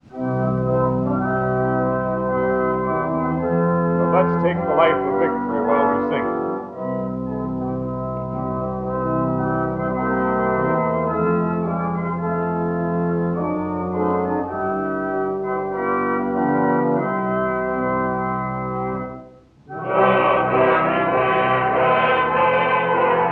Congregational singing and praise, Prayer for ministers; The Victory